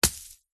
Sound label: foley hard solid object fall hit ground dry grass leaves thud